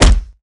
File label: Bullet Impact; gamerip; Mars Sortie